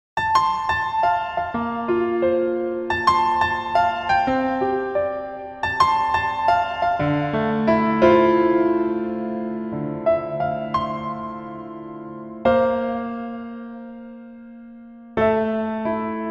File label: Piano ver